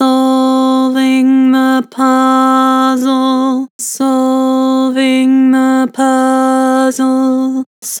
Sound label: Vibrato, Vocals; Harmor Vocals